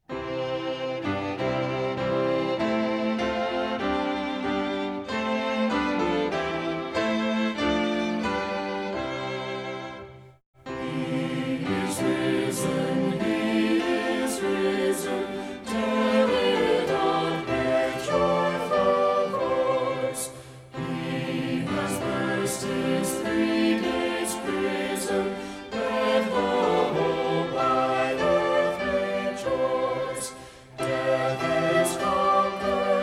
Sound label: vocal and instrumental, hymns